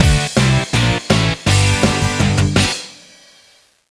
Poprock Fin; Imagine Modern Dancer; Nintendo DS

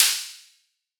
Hat

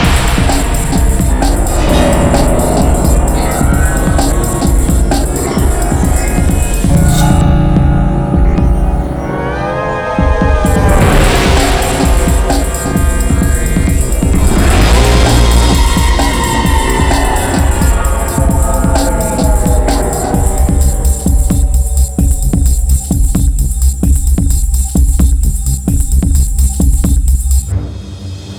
Fight loop; Ben 10 Protector of Earth; Wii

